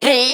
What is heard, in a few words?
Rogue Prepare; Pecheneg